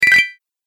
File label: Bonus Collect, video game sound effects